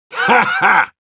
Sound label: Heavy laugh short